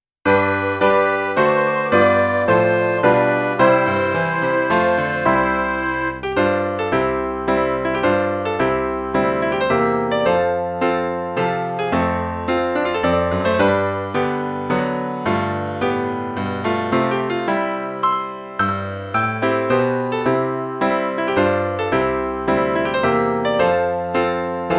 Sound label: midi